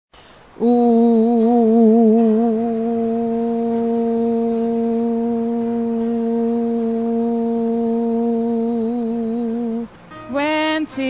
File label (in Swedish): bas